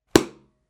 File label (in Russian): knigi zvuk